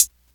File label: dark tone hi hat closed sound a sharp key